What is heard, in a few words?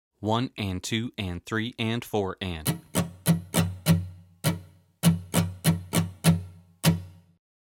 Muted Strumming Drill